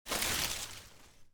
plant grow

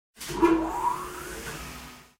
elevator

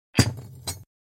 screw hit; metal

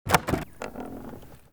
board shake

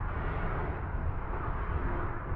airflow; huntingfish

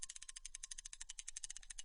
fishing not reeling

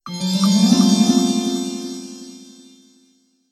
spell of Time

